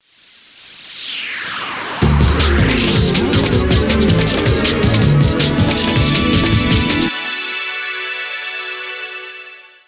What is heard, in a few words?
Game Clear; Chain Blaster; 3DS